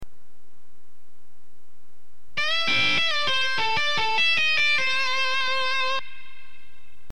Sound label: blues minor pentatonic exercise, guitar